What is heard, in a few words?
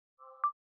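bell glitch C major; bespoke - Floral v.2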